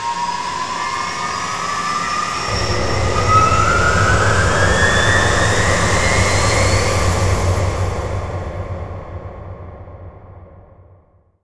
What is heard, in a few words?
space ship undock; terren; Earth and Beyond; EA Games